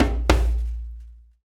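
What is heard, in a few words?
PERC HAND DOM; Roland LCDP14 Africa VOL-2; BTS Af.Prc.Loops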